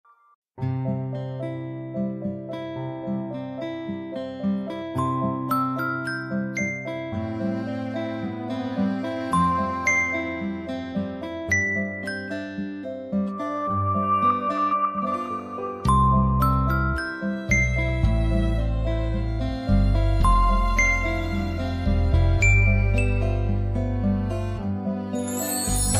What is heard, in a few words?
star alarm default